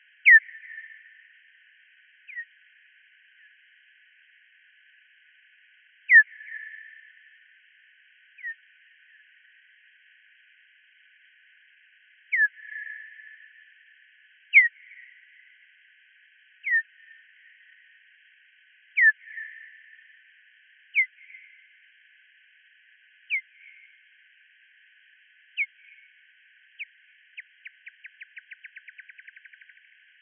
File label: Hoary sass then with ring pitched low